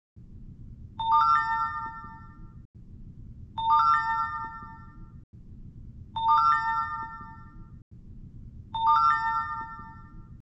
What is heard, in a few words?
power on